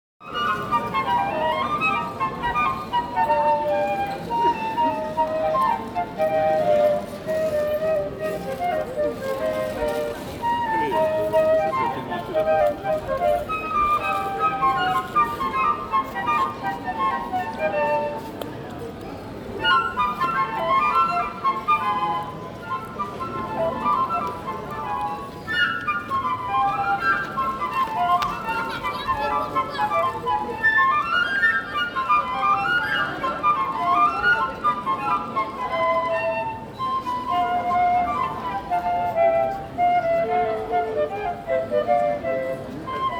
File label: Flute Players St Malo; Field Recordings